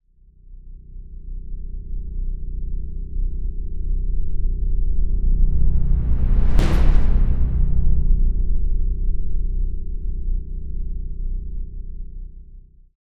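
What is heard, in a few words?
Reverse Boom Evil; Cinematic Metal Impacts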